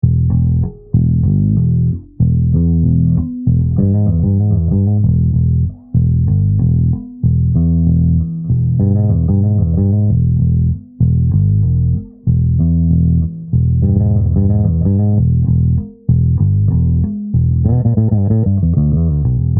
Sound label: finger groove